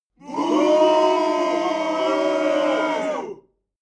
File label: male crowd booing short